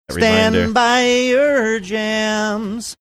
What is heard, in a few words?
singing stand by your jams